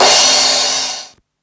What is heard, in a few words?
Crash, Ensoniq Mirage